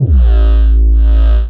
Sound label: aggressive dubstep growl